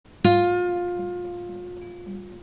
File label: vibrato